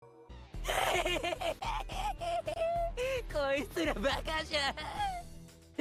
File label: laugh; floyd